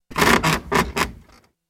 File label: Bed Squeak Rattle; bed movement